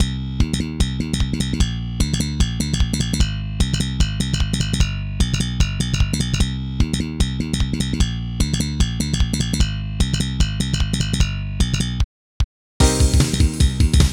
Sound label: Instrumental; Bobs Burger Brawl; Windows